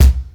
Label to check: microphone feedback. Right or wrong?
Wrong